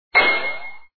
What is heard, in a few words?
Clang sound; Wario Gets Straight